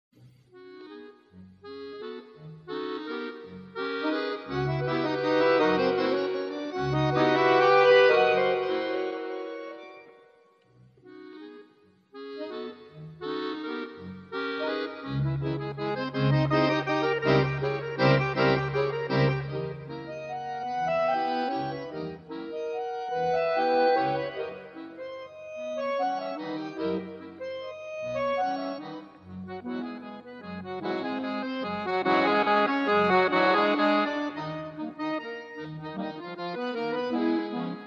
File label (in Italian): Onde di Veli